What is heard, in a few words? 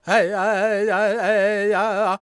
C# MIN, World Voices, LAKOTA